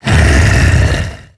alien idle; xenomorphs

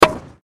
hit; wario

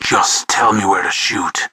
battlecry; tf

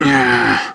backstab

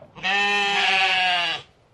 sheep

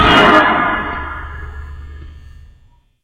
teleport; freak fortress 2; fazbear; freddy

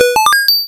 tune; arcade

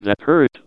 pain severe; pyro; johnmadden

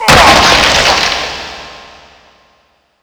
kill; slender; isabelle

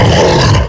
attack; kfsmr flesh clown

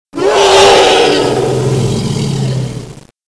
spawn; Predator Mod

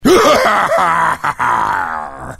laugh; freak fortress 2; gangplank

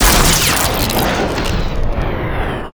bot crash

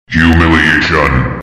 hum

